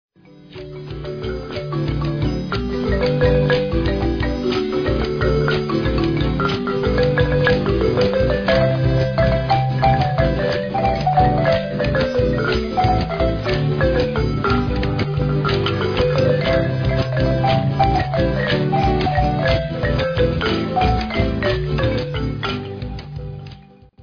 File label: marimba, Costa Rica; El Torito